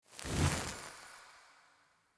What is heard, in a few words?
Fire Blade End; Weapons; Enhancements; Elemental